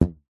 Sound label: rubber band large ping twang; foley; zapsplat